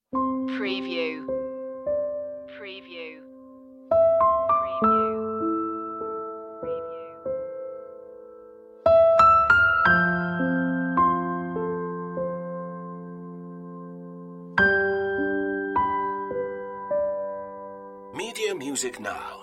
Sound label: piano; media music now